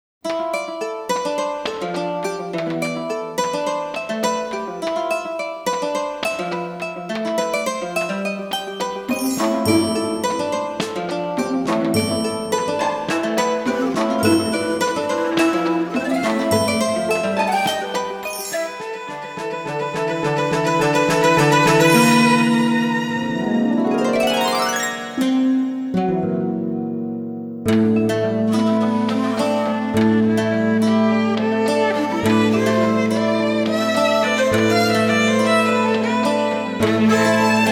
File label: Dark Harp; Soul Nomad & the World Eaters